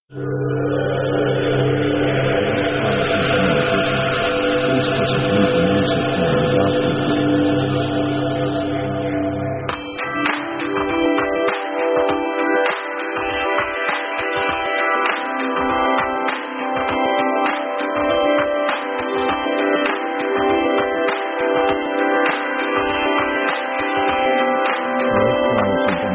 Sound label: background; synth themes